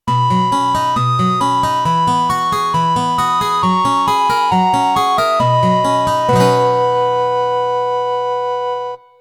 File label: Jingle; Dyglone